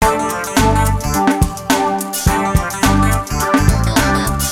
Funk acid; FUNKACID5

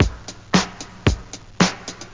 breakbeat; beats

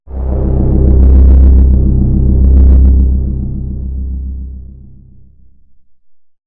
stringy, Synth

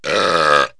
Large Burp, Human, Bad Habits; 16-bit; KABOOM! - Wild and Wacky Sound Software; 1,000 Sounds

